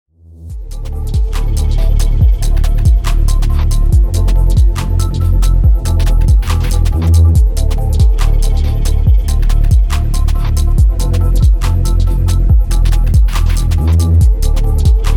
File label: chimes; STARLIGHT EXSERT